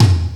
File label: TOM, TOMS KIT, Ragga & Dub Reggae